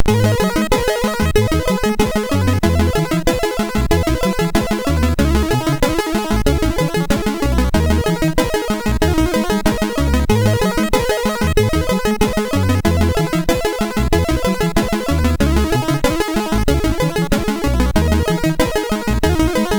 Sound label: Agony, MOS6581R4